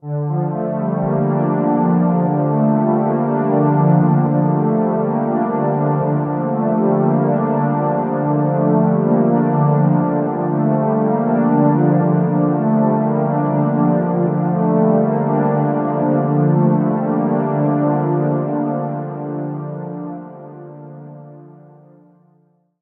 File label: Multi Part Pad; Waldorf Kyra